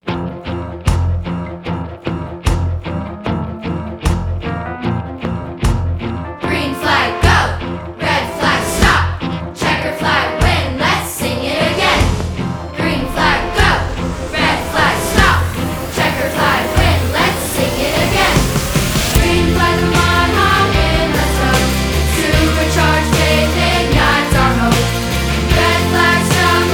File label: Band Vocals